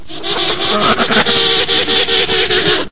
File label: penguin; stamps; animals; birds